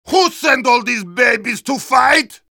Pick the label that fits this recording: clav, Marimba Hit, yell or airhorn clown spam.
yell